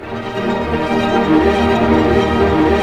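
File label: strings arpeggio major, symphony orchestra; Roland LCDP08 Symphony Orchestra; STR Arpeggios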